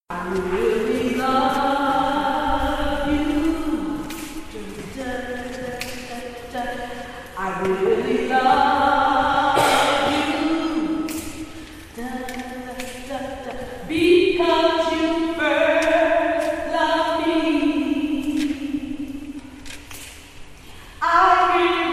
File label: TENOR